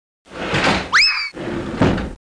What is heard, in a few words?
Laser Lab Sound; Zap!; EdMark Corporation